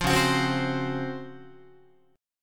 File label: Db Major 11th down Guitar; strummed; JGuitar